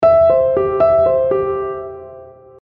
notification

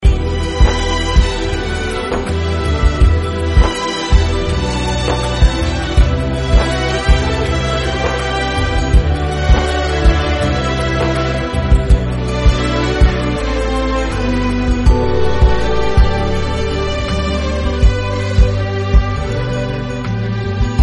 voilin ringtone

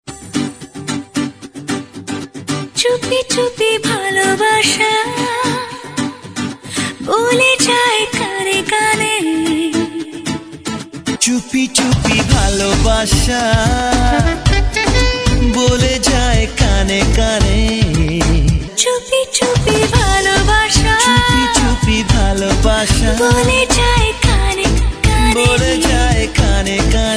Bengali romantic ringtone

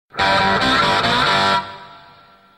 bad notification; ringtone

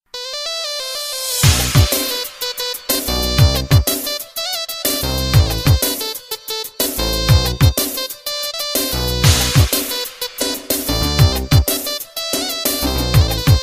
amazing arabic tone

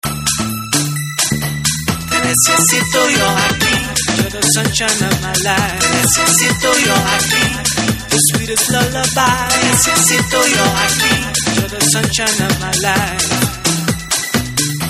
latin ringtone

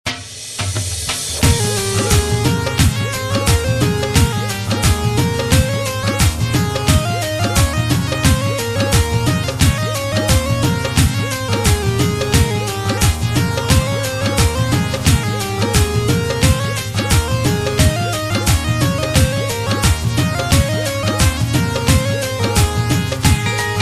romantic ringtone

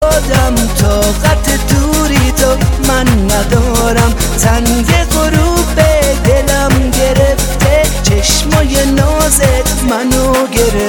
best uzbek russian

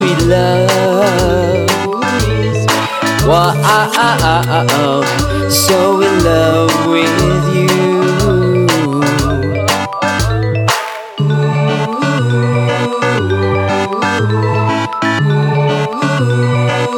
singaporean wa aa aa ao ringtone

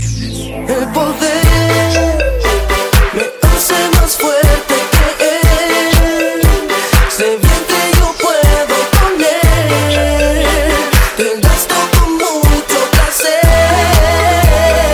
new latin ringtone

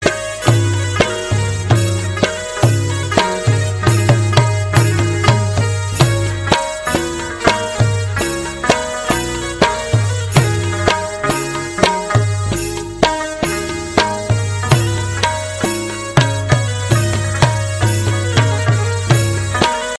pashto dhol ringtone

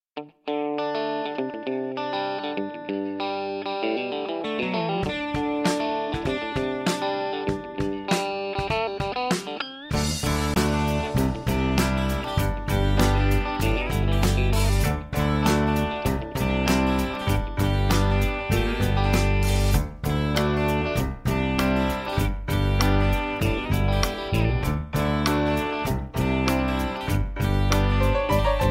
best music ringtone

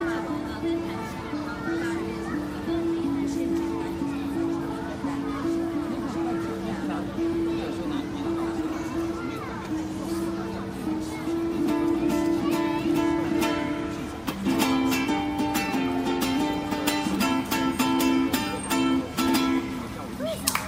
taiwan ringtone

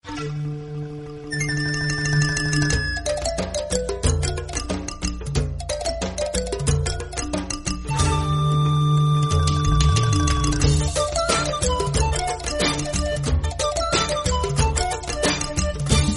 african sms

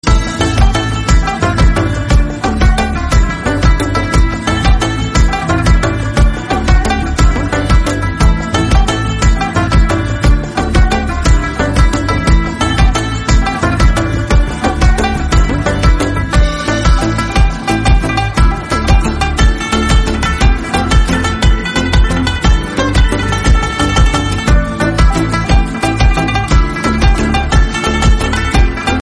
pashto dance ringtone

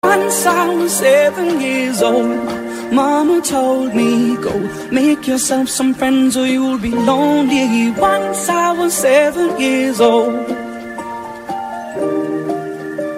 ringtone

